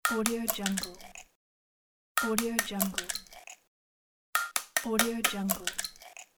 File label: egg breaking